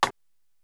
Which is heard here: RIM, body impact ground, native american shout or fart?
RIM